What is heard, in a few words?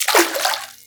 fs fm water, footsteps; Portal 2